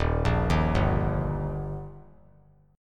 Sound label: D sharp Major D sharp, chord; arpeggiated; 3,5,6,5; JGuitar